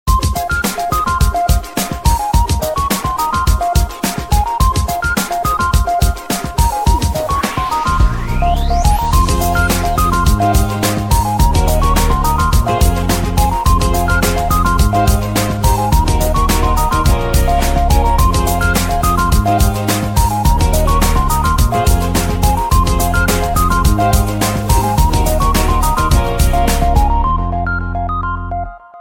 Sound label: Apple Beat